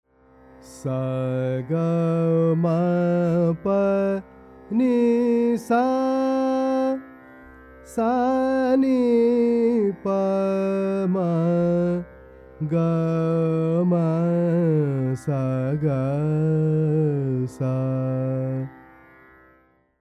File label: Raga Jog